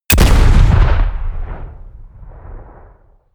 shot

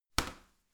garbage container light lid shut hard

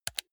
console controller playstation press fast hard

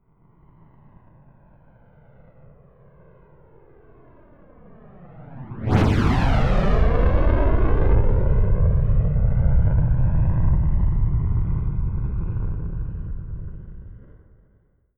spaceshuttle rocket flyby right to left fast

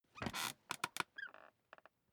office chair creak sit up short

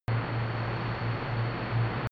drone spaceship tonal

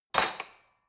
cutlery fork drawer place hard

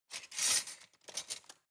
cutlery large multiple drawer grab

